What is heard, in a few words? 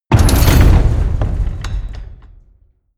door slam medium